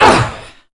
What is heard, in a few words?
banshee pain; garrysmod